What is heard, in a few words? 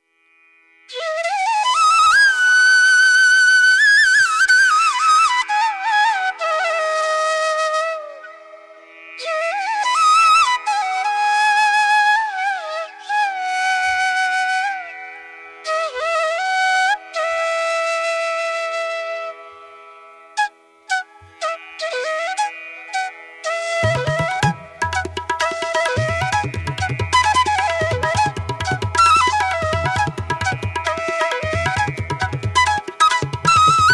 flute ringtone